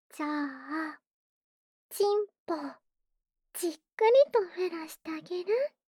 無垢 ねっとりフェラ; フェラ素材

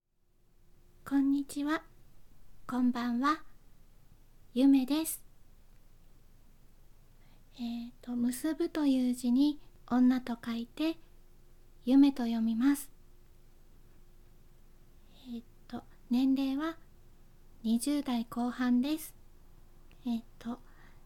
指でクチュ音

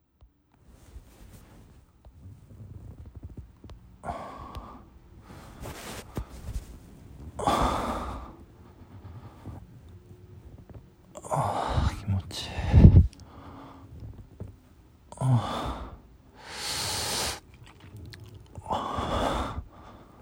オナニー実演 リアルな自慰中の吐息と喘ぎ声; セクシーすぎるASMR♯02

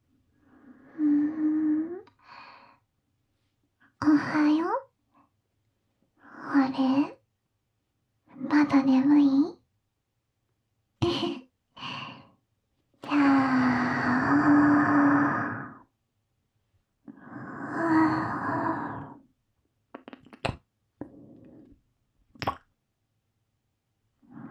キス！キス！キス！, ASMR

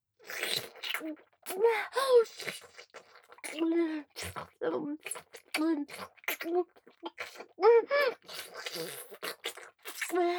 騎乗位しながらの乳首舐め; 乳首舐めループ

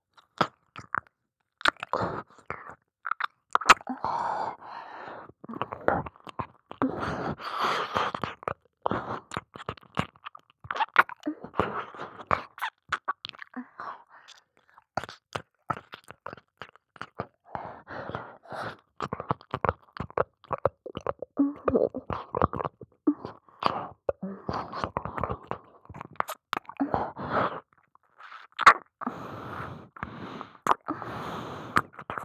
耳舐め・普通

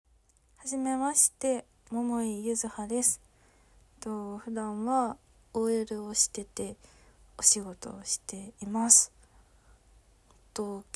ガチ排便

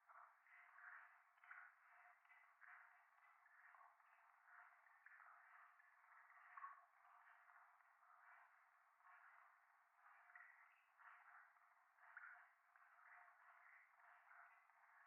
フェラチオ 雨上がり; 夢の中

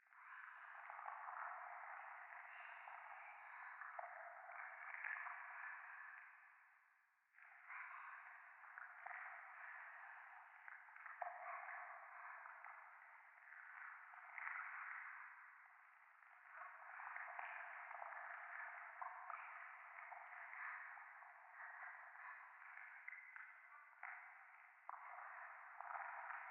廃墟 左耳密着処女喘ぎ 触手

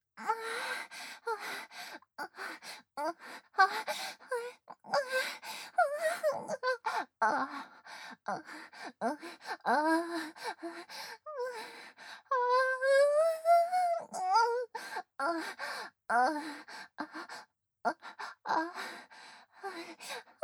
喘ぎ声・普通・正面下

